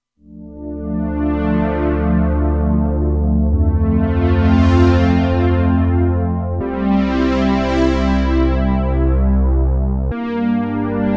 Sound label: String Sweep, Roland Alpha Juno-2; Original Sound Chart patches